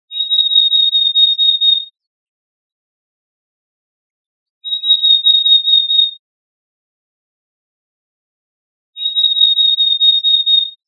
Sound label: Black-capped Chickadee monotone song